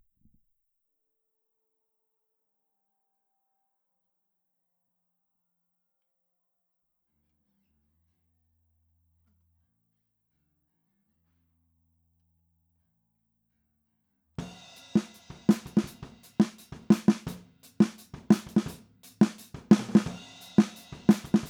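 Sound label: SM57 snare, Drums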